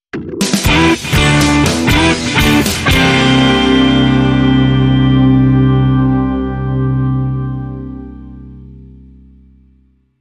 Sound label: Rock Syncopated